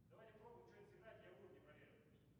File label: Vocal